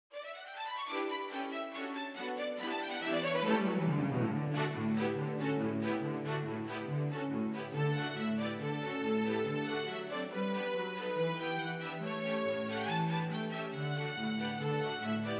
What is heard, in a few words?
Jingle Bells, strings; An arrangement for mixed junior strings of Pierpont’s ever popular ‘Jingle Bells’.